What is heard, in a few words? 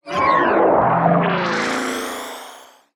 Sci-fi Transition